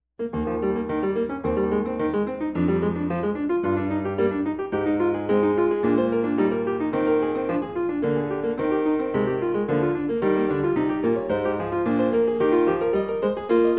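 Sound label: Bach French Suite 4 allemande, piano; bwv 815; FRENCH SUITES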